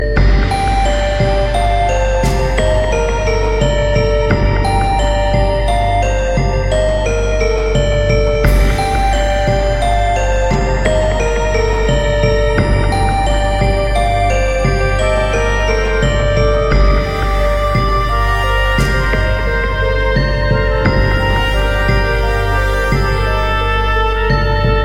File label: Electronic Melody